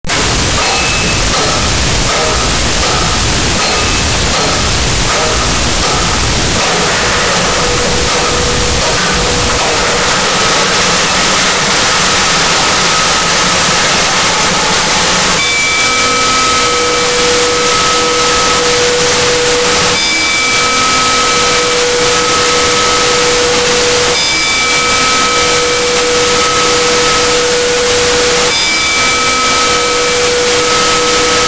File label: Antique Clock Strike; 1001 Sound Effects; Foley